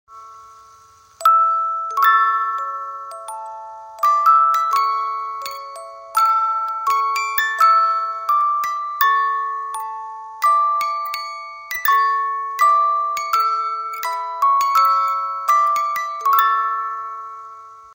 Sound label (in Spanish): Marcha Triunfal Graduacion